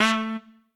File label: Trumpet melo